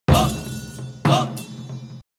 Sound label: sms